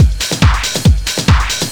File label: squelchio; Zero-G Deep House; 140bpm